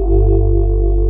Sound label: WAV SWEEP G1; Big Fish Audio; Synth City